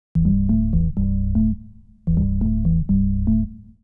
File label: organ house 125 A; eJay; Keys